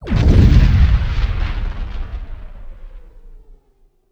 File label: impact, Bridge Impacts; sfx